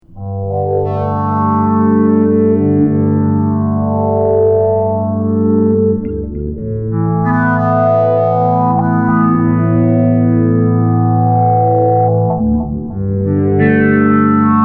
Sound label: bass poly slow gear